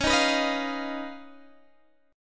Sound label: Eb 7th Suspended 2nd Sharp 5th, Db